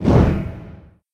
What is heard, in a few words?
shield; Risk of Rain